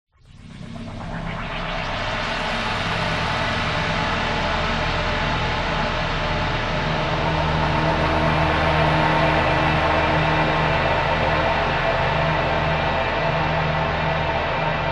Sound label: sound design drone drone swell airy dark; zapsplat